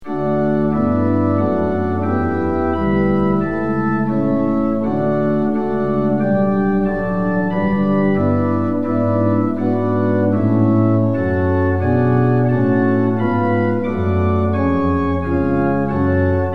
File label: tune; hymns; lillenas